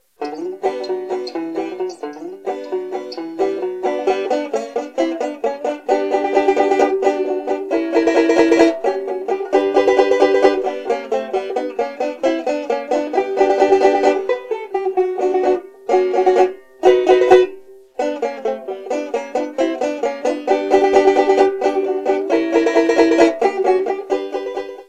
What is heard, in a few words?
Banjo Vamp Short Sample